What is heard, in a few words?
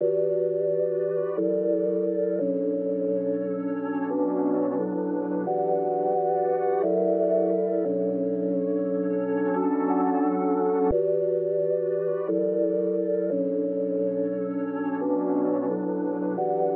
88 BPM B Min Pad; Melody; Loop Stems; Cymatics; 2022 Melody Collection Platinum Expansion - Pt 1; Flashbang